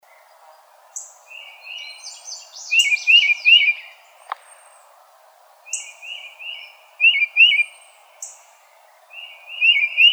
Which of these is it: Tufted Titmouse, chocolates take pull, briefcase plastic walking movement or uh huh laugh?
Tufted Titmouse